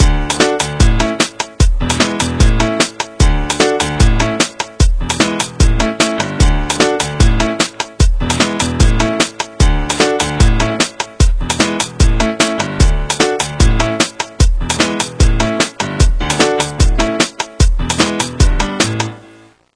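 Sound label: Piano 150; Klavir 24; Talking Gina the Giraffe; Android iOS gamerip 2011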